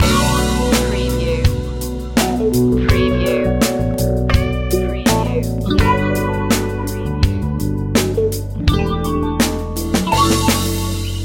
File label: life grooves loop